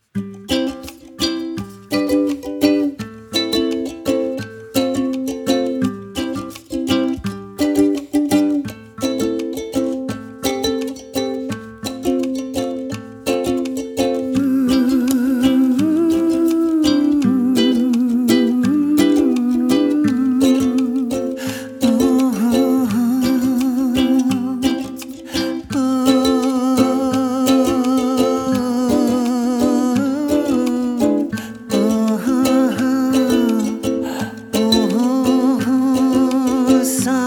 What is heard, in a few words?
Instrumental avec chanteur